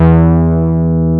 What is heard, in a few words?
E3, BASS; AKAI S-Series CD-ROM Sound Library VOL-8; SET#1 BASS